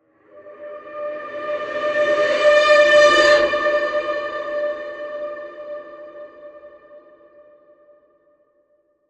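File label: creature call; One Call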